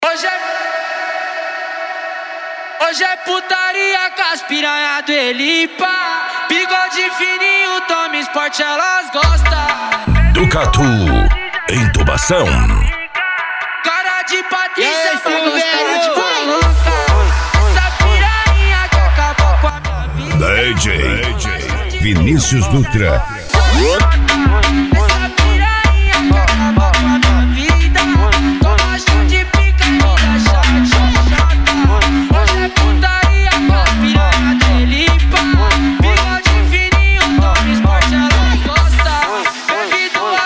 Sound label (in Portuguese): funk agressivo